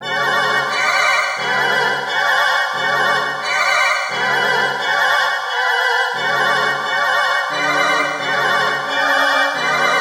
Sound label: Vocal Her Voice